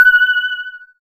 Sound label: SYN WATER D, Microwave; Sound & Vision - Gigapack I CD 2